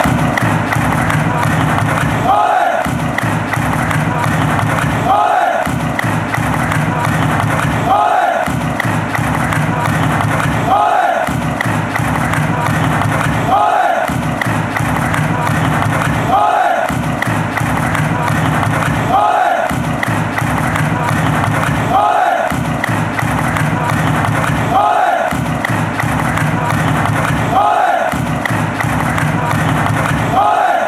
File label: ole, fanchants